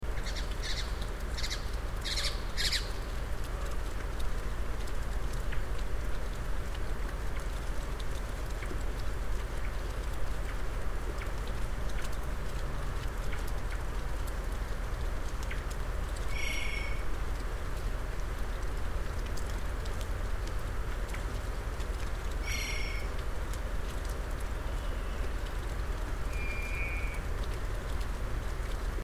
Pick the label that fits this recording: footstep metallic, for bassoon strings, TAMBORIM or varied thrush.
varied thrush